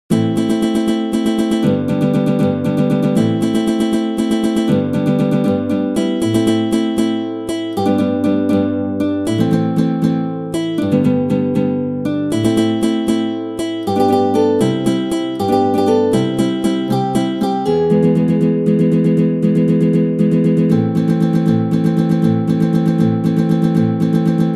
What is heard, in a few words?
synth